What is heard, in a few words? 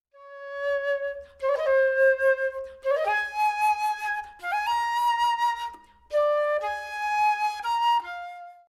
flute